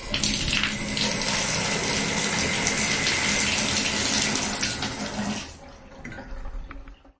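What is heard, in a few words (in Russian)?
заканчивают мыться под душем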